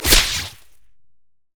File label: wildcards; LoL; twisted fate